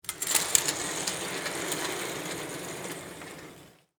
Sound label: Stage Curtain Close, Foley, Stage, Curtain, Close, Small, Theatre; Film Set Sound Effects; BlastWave